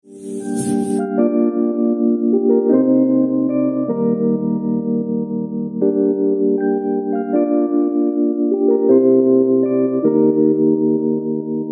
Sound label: Acoustic Live